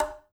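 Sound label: conga, left; Spectrasonics; Liquid Grooves 1 & 2; CONGAS+BGOS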